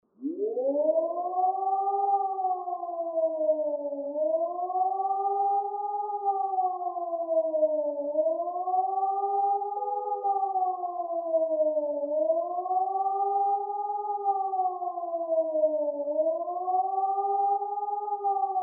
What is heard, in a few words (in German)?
Sirenensignal Warnung; KatSchutz